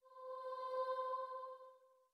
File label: starting note, voice; whole steps